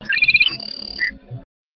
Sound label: Com Anser